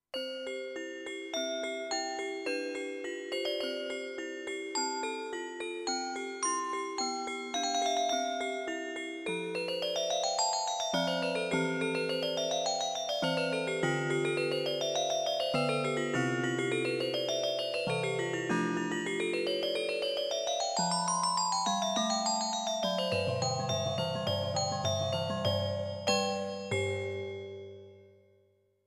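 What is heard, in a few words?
Glockenspiel, digital piano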